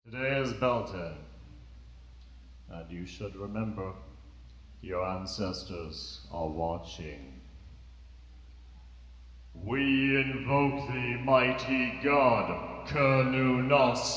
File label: Cernunnos Chant